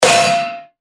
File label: percussion; Fossilize; revealed percussion vol 2